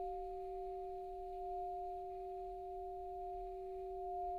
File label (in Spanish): Multiarmonicos